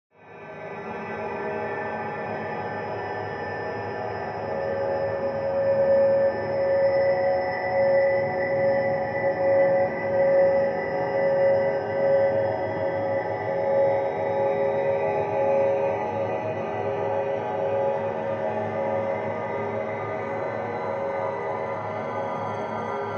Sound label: Ambience Crystal Pa